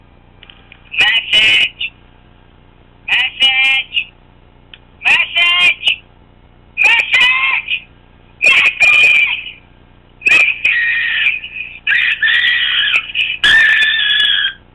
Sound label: Screaming Message Ringtone; iPhone